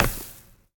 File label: Clap; Smite; god Kumbhakarna